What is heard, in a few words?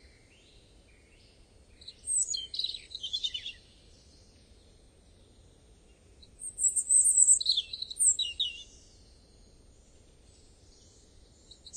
robin; server-nalc; ambience modpack